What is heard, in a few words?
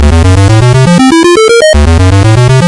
ascend